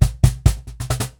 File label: Cajon Fill; musicradar